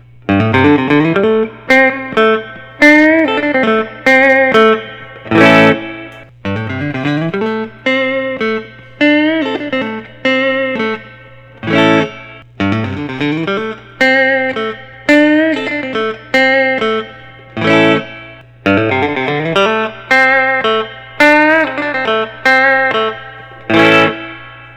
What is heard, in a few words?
fender custom shop; page era custom esq